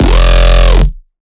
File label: Riddim Bass Hit F; Aztec